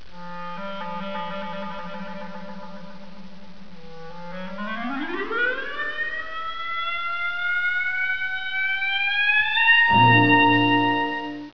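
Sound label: clarinet sound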